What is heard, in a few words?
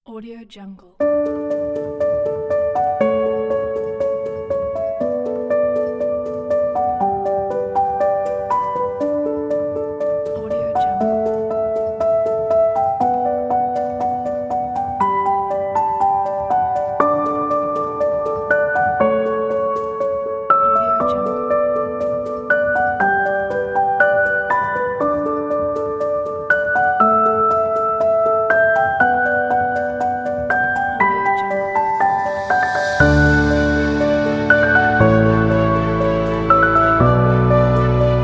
sentimental